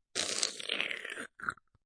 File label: Slurp, Slurping sound.; Slurping Sound Effects; Human